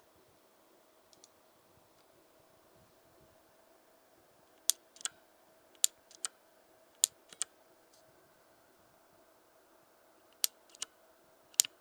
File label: Key Sound Slow, keyboard